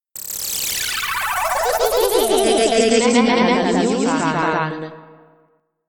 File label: Jingle